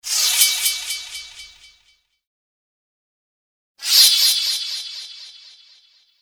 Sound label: sword